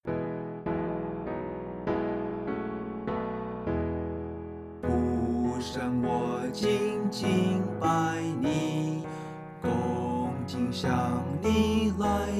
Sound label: vocal; Hymns; Chinese